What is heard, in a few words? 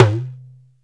talking drum up, Ethnic Percussion